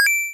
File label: coin; Leenkx Templates; platformer